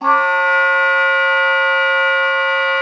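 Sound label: oboe d'amore; Xsample VOL-7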